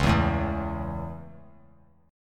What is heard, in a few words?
Db Minor Sharp 5th Db; strummed; Dbm#5; JGuitar